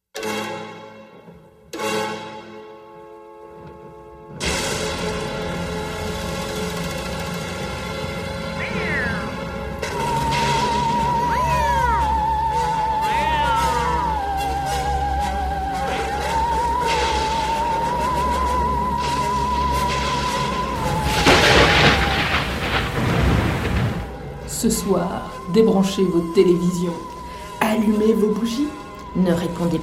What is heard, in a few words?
jingle; radiorageuses